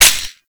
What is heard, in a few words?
Flux Rifle Reload; Crp Laser Rifle; BE Flux Rifle